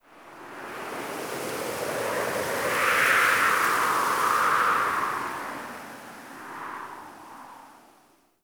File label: Camp Wind Gust A; Quests; The New War; Ambience; Drifter Camp